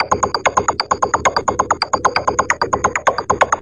132 bpm, blip blop synth perc, drum loops and breaks